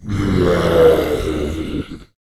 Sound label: canibal zombie